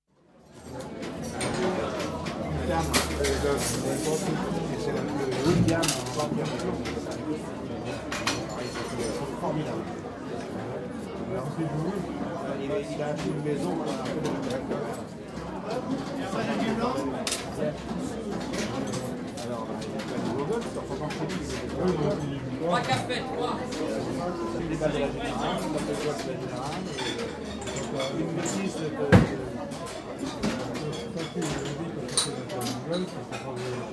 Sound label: Close perspective atmosphere at counter of busy lunchtime cafe, with expresso machine; expresso machine heard at 3'39; Restaurant; BBC